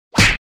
Punch Face Hit Sharp Impact; Fights Bodyfalls

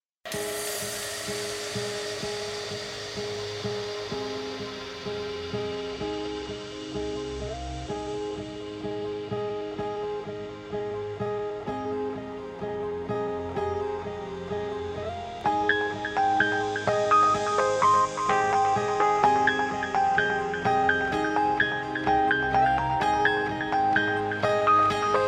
Chillstep Remix

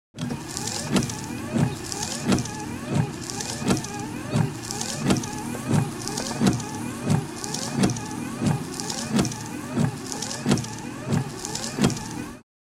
Windshield Wipers Fast Speed BMW; Cars

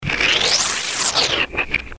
Noizzer; Best Of N2O Library; Breakers & Transitioners

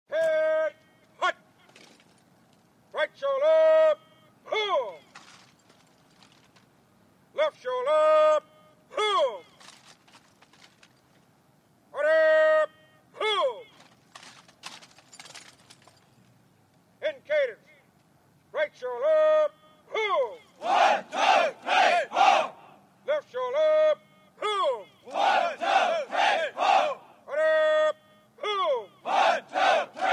Manual of Arms; SOUND EFFECTS1

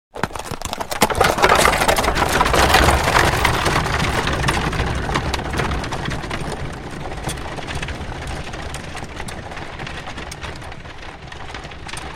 Horse Drawn Carriage Start An; Horses Carriages and Traps; Hollywood Edge Historical Series

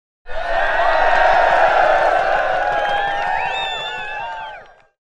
Infantry Cheers 1000 Men Cheer; Historical; Hollywood Edge Historical Series; War Horses Armies & Weapons

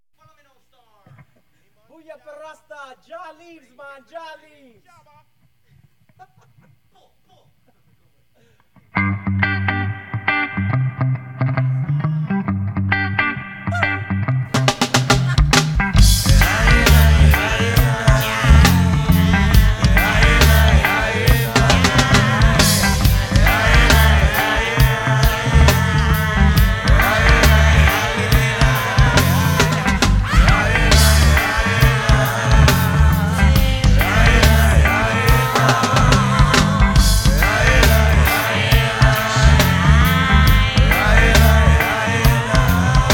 Reggae Jam

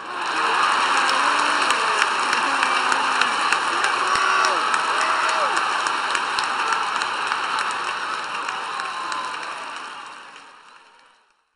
LARGE OUT, APPLAUSE; 1000fx

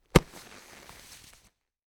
Body Impact Bump Grab; Foley; Foley Essentials; Foley - Misc

